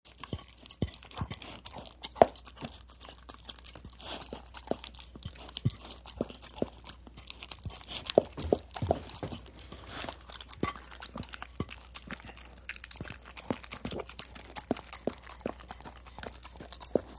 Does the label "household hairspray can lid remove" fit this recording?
No